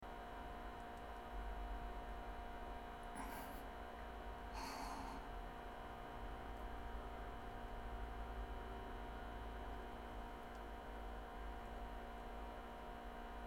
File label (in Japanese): 大、連続ミチ音ポチャ音吐息ウォシュレット